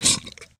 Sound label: mud